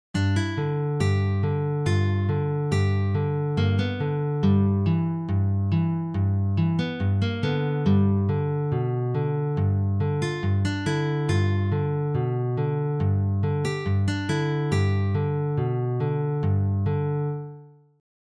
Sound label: Spike driver blues